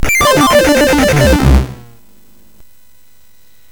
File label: sfx, MOS 6581; MOS6581R4; hvsc